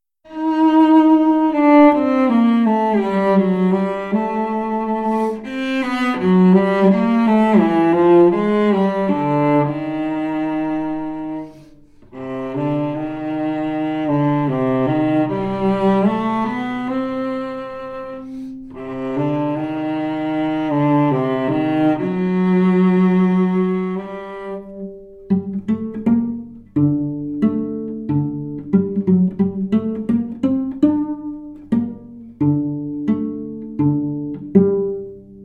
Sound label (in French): Violoncelle